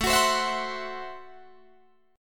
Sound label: A Minor 6th A Guitar DADGAD